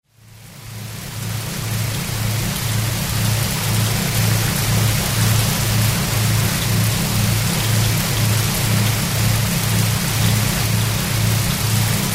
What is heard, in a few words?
rain